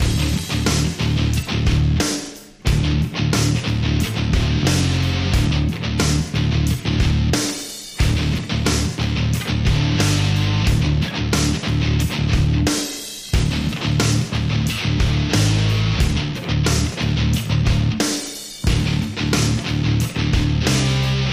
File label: hammerhead loop